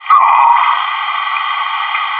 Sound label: pain; maxpack